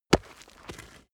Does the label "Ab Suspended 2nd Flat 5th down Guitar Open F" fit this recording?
No